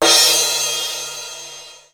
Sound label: HI CRASH; BEAT2000